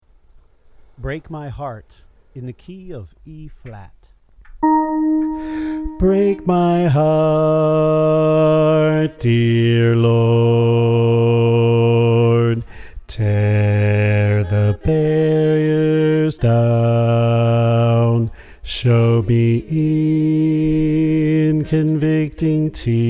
Bass

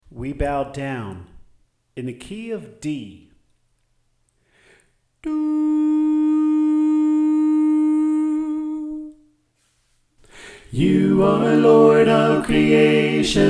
Equal Mix

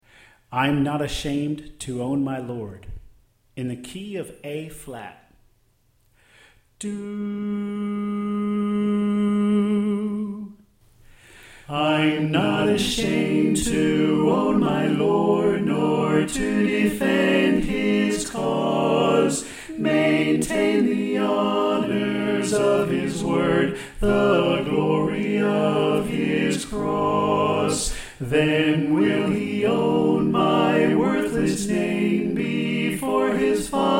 Soprano Left

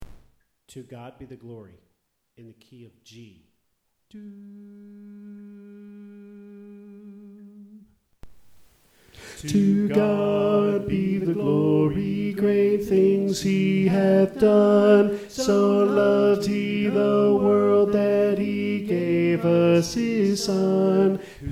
Tenor

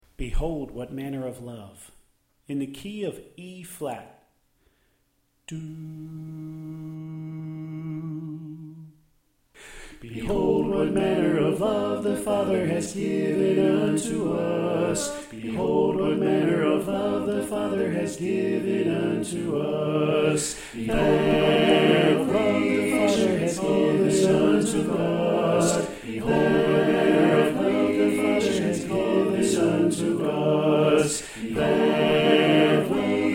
Tenor Left

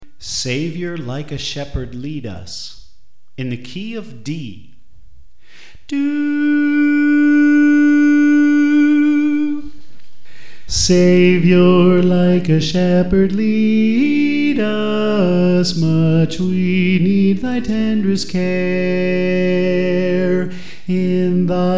Soprano

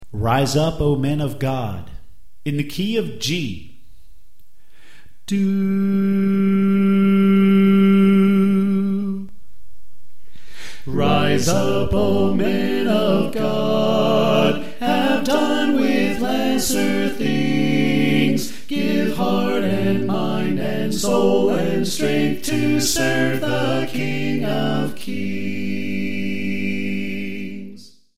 Soprano Left

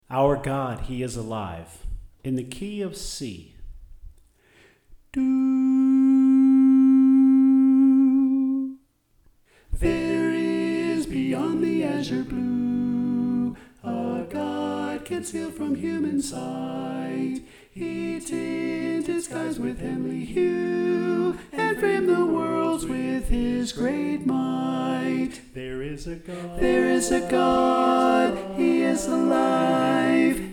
Alto

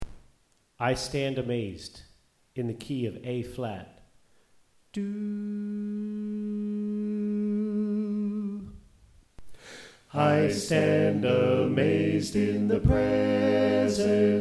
Bass Left

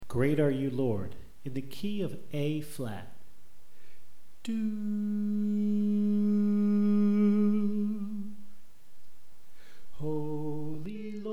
Bass

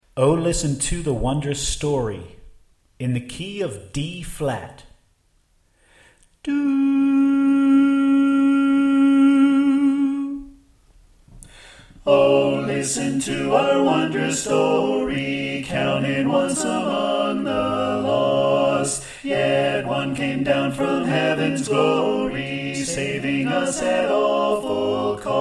Soprano Left

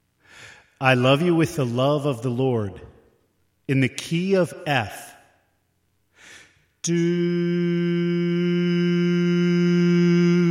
Soprano Left

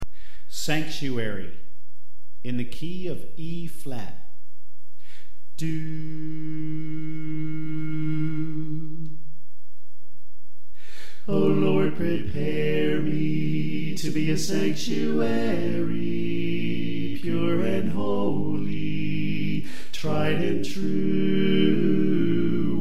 Tenor Left

